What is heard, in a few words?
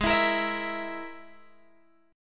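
Ab Suspended 2nd Sharp 5th chord, Guitar, DADGAD tuning; strummed; 18,19,20,21,19,x; JGuitar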